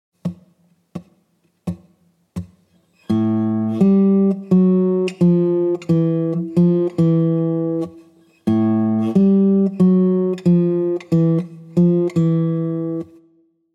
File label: Blues Guitar Turnaround